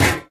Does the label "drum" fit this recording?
No